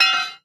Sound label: Metal Light Collision